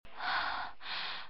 Respiration coquine

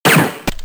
Pistolet; bruitage; Armes

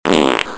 Pet

